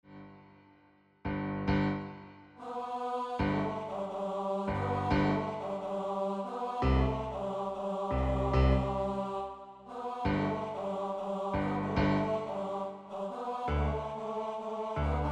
Lazarus Come Out hi TTB midi

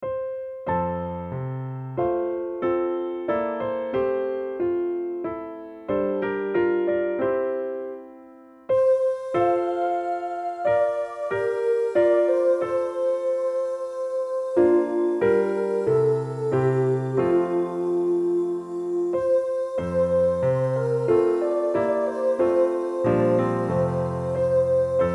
Piano Study F HIGH

